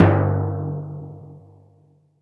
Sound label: Timpani G3